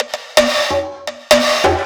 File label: Percussion, 128 BPM; Vengeance Samples; Vocal-Hook Kits; Song Kit